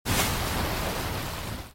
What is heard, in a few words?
boat wake